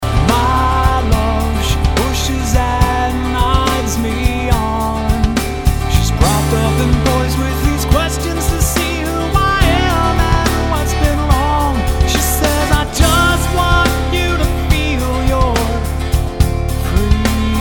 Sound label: she screams